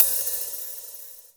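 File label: hi hat, drums; Best Service; Real Mega Drums VOL-1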